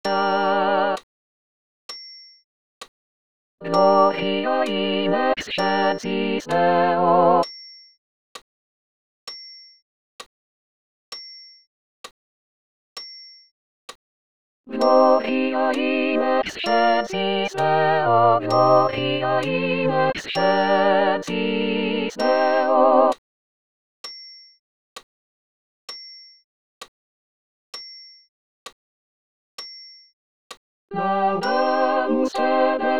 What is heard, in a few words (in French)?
tous voc; Gloria